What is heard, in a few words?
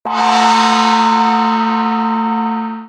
Japan Gong